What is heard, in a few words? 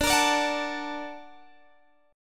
Bb Augmented Major 7th D